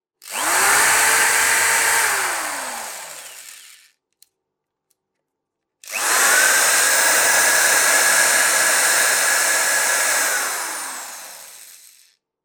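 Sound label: drill sound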